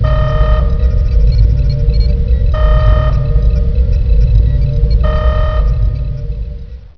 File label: red alert